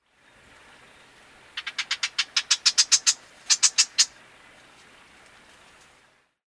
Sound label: Marsh Wren, Cistothorus palustris